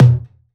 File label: Toms; Mutekki Media; Vengeance Electroshock Vol.2; VES2 Percussion